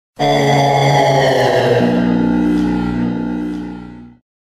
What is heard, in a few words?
Jingle